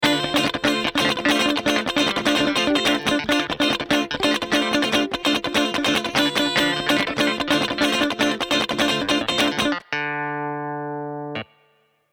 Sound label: Heritage Audio HA 609A GuitClav Group comp